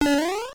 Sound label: Web Page Loading In Progress; Salem's Sound Schemes; mario